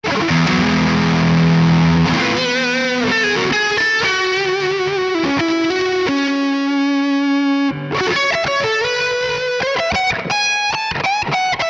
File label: Mesa Boogie Slightly Honky Tonk Mid Gain Lead; Neural DSP